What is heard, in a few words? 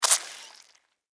Insect Spider Bite Suck; Spiders; Animals:Insects